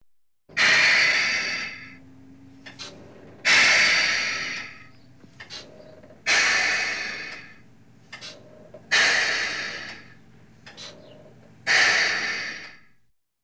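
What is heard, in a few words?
dizelnij gruzovik gidravlicheskie tormoza prokachka, diesel truck, hydraulic brakes bleeding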